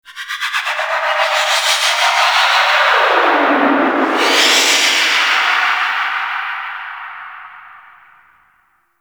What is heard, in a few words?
Duviri Undercroft Shriek Tonal Verb One Off; Warframe